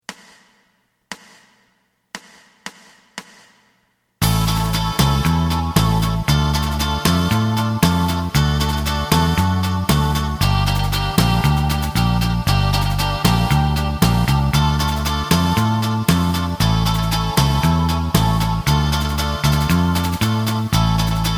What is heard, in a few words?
lead